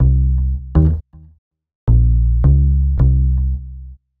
Bass, Futurist Drum & Bass

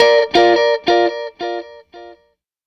Strat Guitar Loop

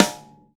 WD Rim, Roland BK-5, Snares

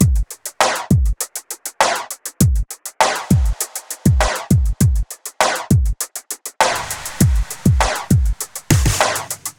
100 Beat; Zero-G - Future Wave

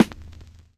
vinyl snare; Trance; Drums; Snares; Trance Explosion Vol1

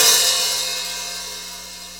HAT

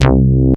fast TG C3, fat TG bass; Best Service Dream Experience

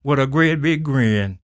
SING, Blues Soul; Spectrasonics Vocal Planet

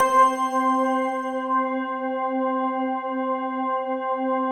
PAD, DIGI-PAD

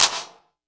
kit, Yamaha Motif-ES8

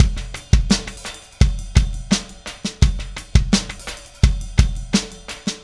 Static Beat; Sonic Foundry (Sony Creative Software); Indie Rock Anthems; Session 13 - Static

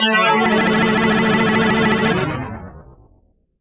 SHIM; AKAI Anime Sound FX

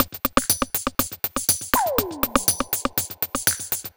121 Nu Skool Jam Perc, Drum Loops; 121bpm; Nu Dance Electro

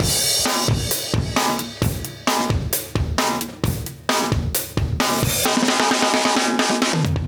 Live Breaks, 132

